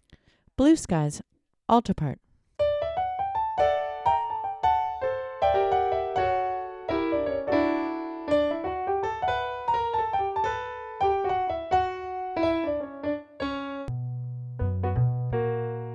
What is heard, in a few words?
2pt alto, Practice Tracks; berwick-choir